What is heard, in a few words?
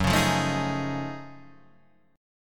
F sharp Minor Major 13th, Guitar, Standard; strummed